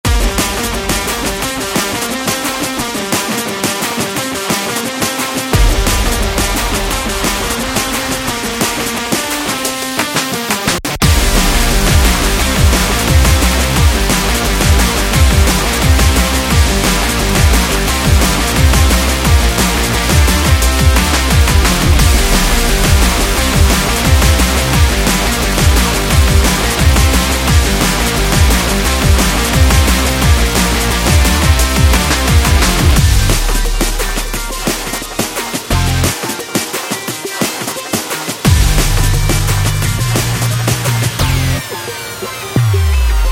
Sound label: Aggressive Energetic Action Sport; epic sport metal